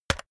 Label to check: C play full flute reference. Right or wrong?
Wrong